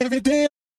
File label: Vocals; TRUTH-HZ; Crowdsourced Sample Pack